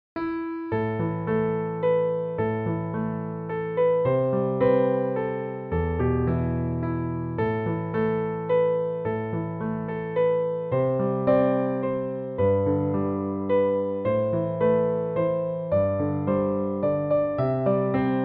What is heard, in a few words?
piano; Spanish Ladies (Piano Solo); Spanish Ladies is a traditional song which originated in the British Navy centuries ago.